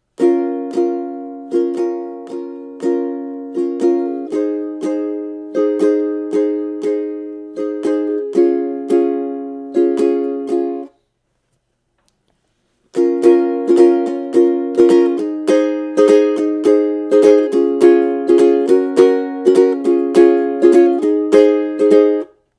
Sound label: Strum, ukulele